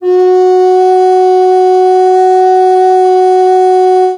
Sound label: FLUTE NOTES, Primitive Instruments; Didgeridoo and Other Primitive Instruments